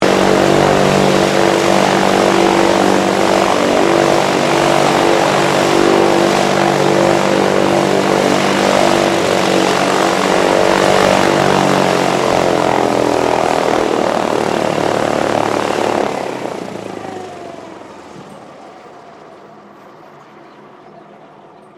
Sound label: Aircraft P-40 Kittyhawk idle Shutdown, WW2 Kittyhawk or Warhawk fighter plane; 1940s; Airplanes